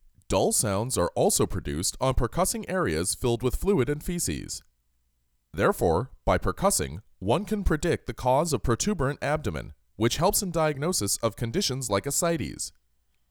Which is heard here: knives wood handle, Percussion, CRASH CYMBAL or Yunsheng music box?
Percussion